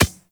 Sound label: Beach Ball Bounce Dirt; Warframe; Water Fight; Beach Ball Floof